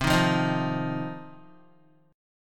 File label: C Minor Double Flat 5th, Guitar; strummed; 4F,4A,5C,5G,5C,6E; 7,6,5,8,5,x; JGuitar